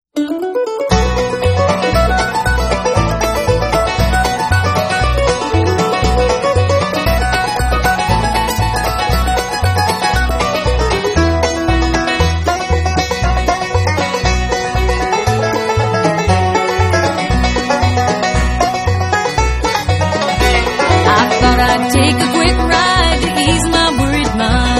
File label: bluegrass